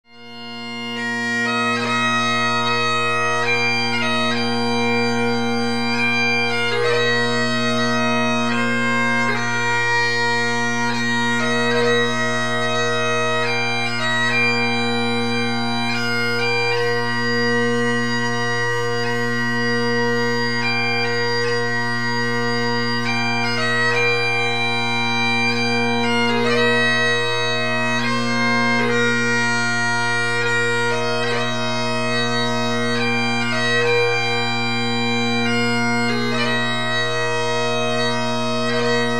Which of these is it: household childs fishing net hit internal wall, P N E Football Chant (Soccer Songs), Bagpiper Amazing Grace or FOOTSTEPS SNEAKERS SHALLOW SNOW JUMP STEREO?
Bagpiper Amazing Grace